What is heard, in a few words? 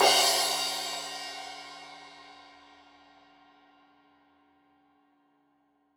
Crash 15in L edge, Cymbals Stereo